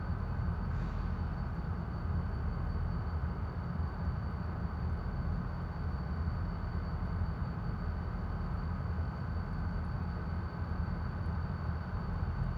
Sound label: industrial engine loop; midnight guns